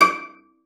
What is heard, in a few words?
CELLO FINGER; 90 s Sample CDs; Xsample VOL-5